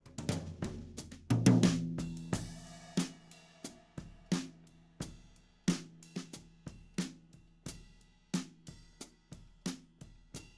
Tom

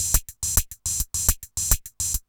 808 Loop Hats 105, Roland 808 Drum Loops, 105bpm

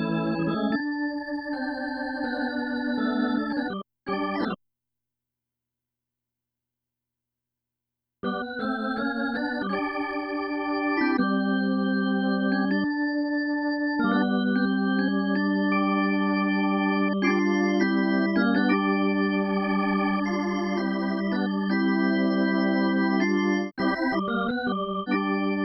Keyboard

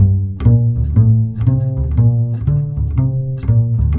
Cool Upright Bass

